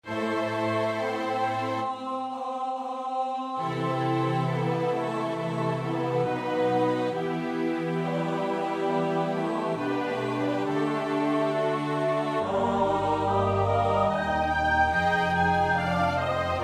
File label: Lasset uns unter einander lieb haben (S-A-T-B); Herzogenberg (Heinrich von); Die Passion Op. 93